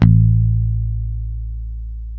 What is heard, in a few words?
HIP HOP, Bass; Spectrasonics; Bass Legends CD 1